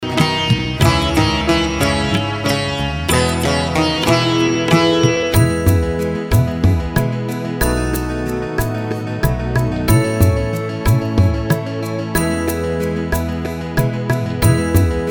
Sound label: Karaoke Song